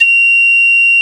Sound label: Flute G#5